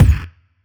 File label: kick; goodtakimu drumkit; fl studio; drumkits